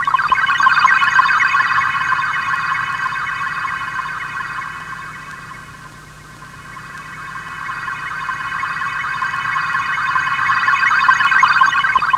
SCI SPACE; AMG - Now CD-ROM (Roland)

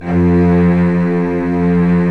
Vcs2 f vb; STR Vcs 1-2 vb

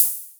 Hi Hats Open; LIMAT Div Dept

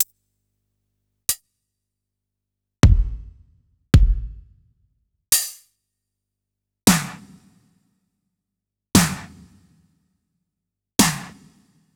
Electro, Kit

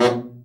BRA Bari, SAX; Best Service Gigapack 1; BR SAX 2

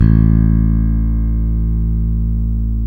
BS, E.Bass, Slap

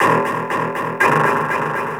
BASE, ELECTRONC

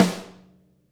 SNARE, Interactive Drum Kits; Sonic Reality; ID SNARE 1+

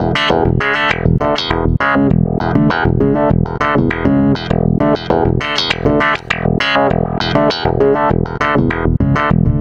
Stick Loop, Stick Bass

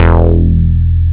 moog; ST-XX Amiga Soundtracker Sample Packs

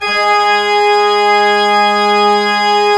ORG, CHURCH ORGAN; Roland - LA Composer vol. 1

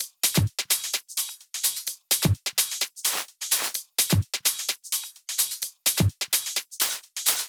Drum Loop 128BPM Solo Overheads; VEC5 Drumloops; VEC5 Multi Loops; Loop Elements

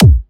glacier kick

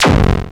Percussive, Drums Perc One Shots; Sonic Foundry (Sony Creative Software)

